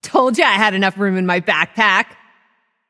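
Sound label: yay, female voice; smite; voicepack; hera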